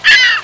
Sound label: Gull sounds